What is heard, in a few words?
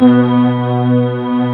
REVE PAD M1 C3; East West - Global Sounds